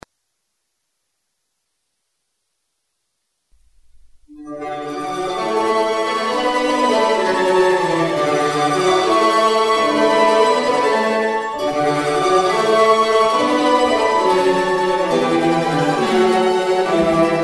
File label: mandolin ensemble